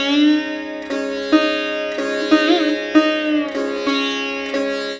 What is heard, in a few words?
SITAR LINE, SITAR MELODY; Best Service; World Colours